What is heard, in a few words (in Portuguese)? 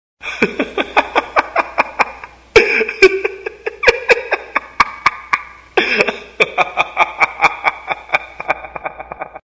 Risada De Louco; Vinhetas